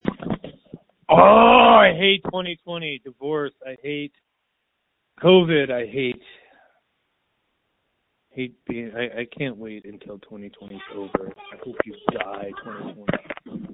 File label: Scream